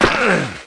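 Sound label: Metal Flash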